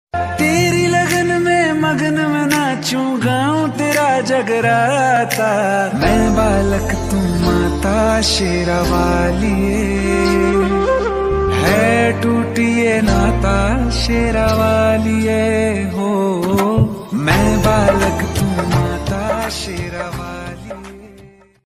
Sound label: ringtone navratri ringtone; mata rani ringtone